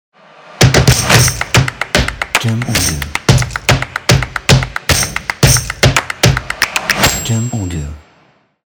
Stomp Claps Ident

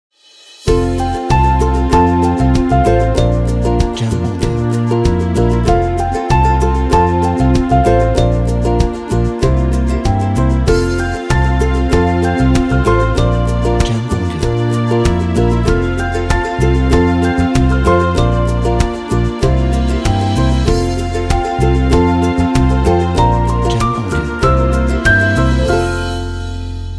Folk Upbeat

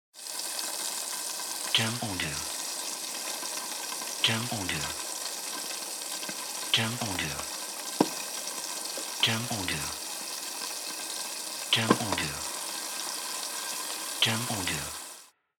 Frying Closed Lid

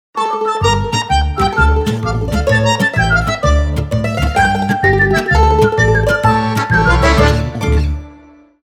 Italian Intro Logo

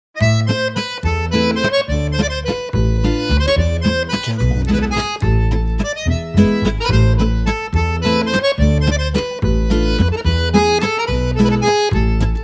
French Intro Accordeon

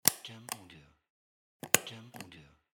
Office Stapler

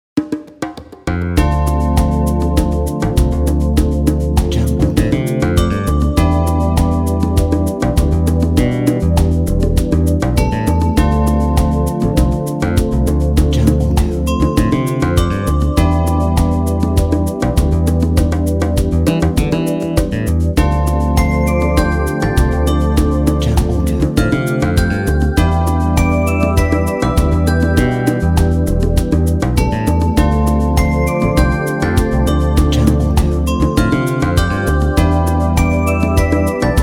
Chill Background

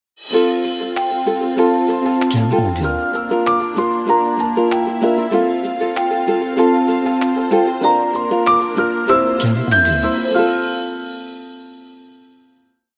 Upbeat Folk Logo

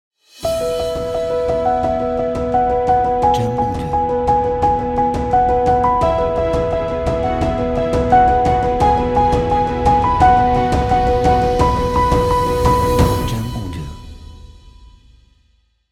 Corporate Inspiring Logo

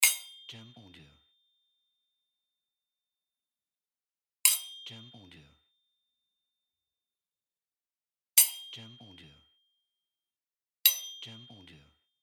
Sword Hit

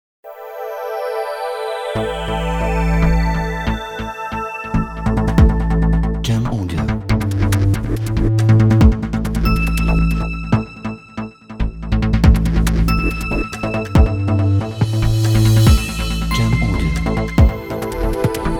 Medical Technology

